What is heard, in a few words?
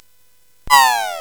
Dark Castle, CSG8580R5